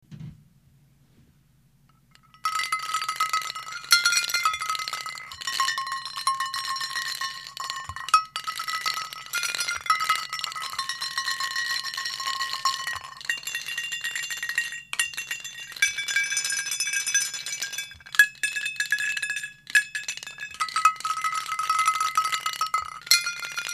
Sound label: bell; リサイクル楽器